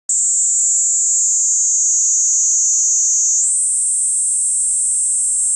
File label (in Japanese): niinii zemi, cry of insects